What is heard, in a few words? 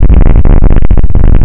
Turbo Loop Sub